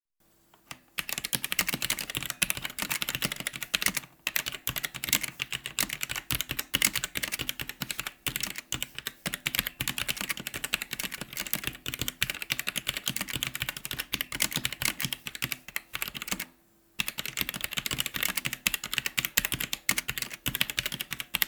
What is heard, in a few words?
Roccat Vulcan 121 Aimo